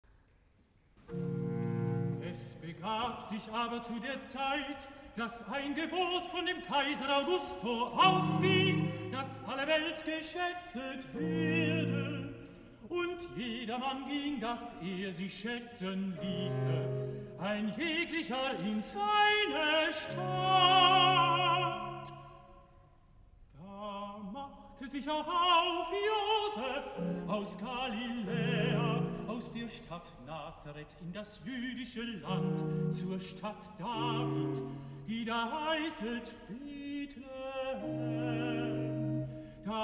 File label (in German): rezitativ tenor; el dia de nadal segons bach